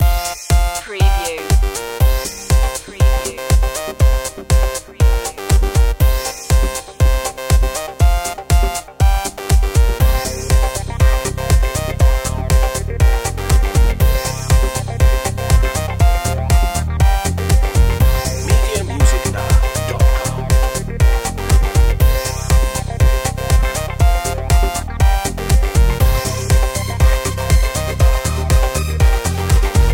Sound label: dance floor